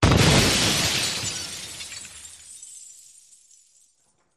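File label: Explosion Glass Debris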